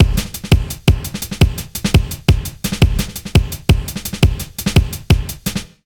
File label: 170 BREAK, Explosive Jungle